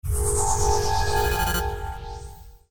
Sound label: magical; maoudamashii